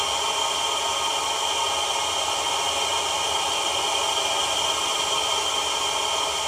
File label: sfx, futuristic weapons sound effects